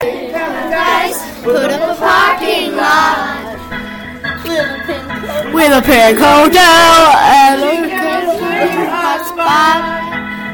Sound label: us singing that song